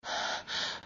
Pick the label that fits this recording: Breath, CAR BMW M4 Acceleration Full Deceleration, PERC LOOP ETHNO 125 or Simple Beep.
Breath